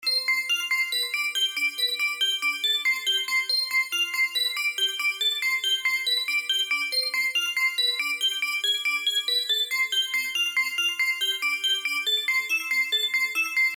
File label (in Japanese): シンセ ベル